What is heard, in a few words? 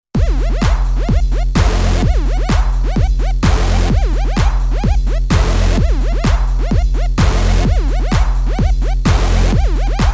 House